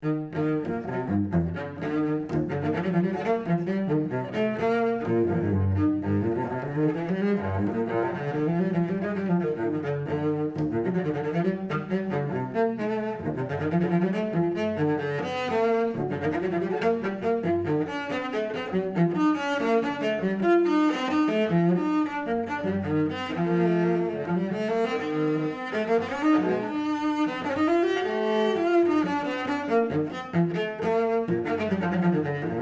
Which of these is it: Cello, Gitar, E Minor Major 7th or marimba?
Cello